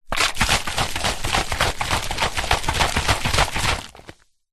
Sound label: bottle 1 litre plastic mostly empty shake; food drink; pepsi; zapsplat